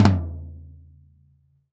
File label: Drums Acoustic, RAW KIT; USB Soundscan; AKAI